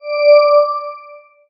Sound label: ui slide; astro Legends Lyra and Erion Desktop; Foxium